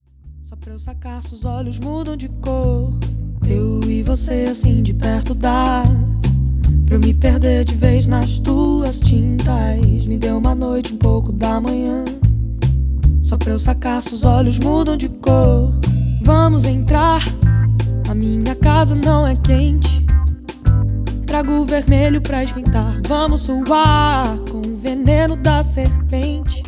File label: Lounge